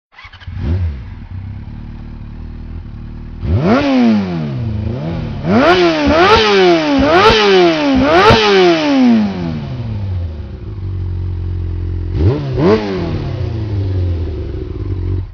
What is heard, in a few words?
Yamaha 600